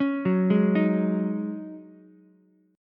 C sharp Suspended 2nd Suspended 4th, C sharp, Ukulele; arpeggiated; JGuitar